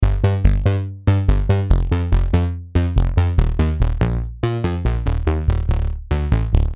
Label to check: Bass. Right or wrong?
Right